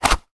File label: household vhs c camcorder cassette case open; zapsplat